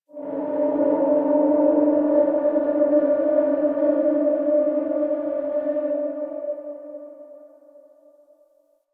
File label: Eerie Drone; Warframe; Ambience; Nineteen Ninety Nine